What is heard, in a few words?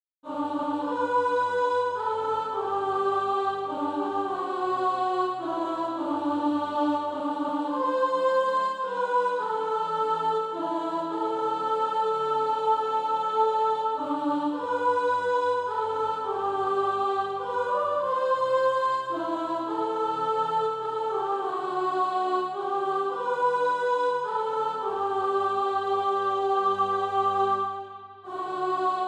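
soprano, Practise Music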